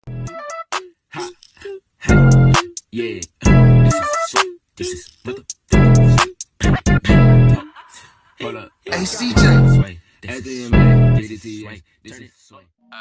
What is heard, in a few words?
Woah Hah Hah Yeah; English